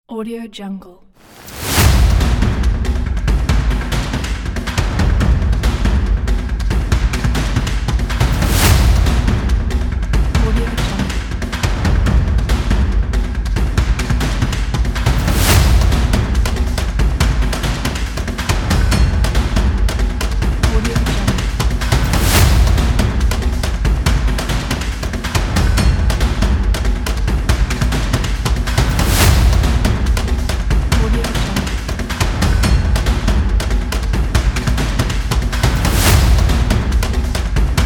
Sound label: epic drum percussion; andromedasound